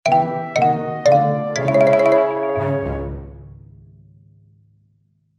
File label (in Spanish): jingle perdiste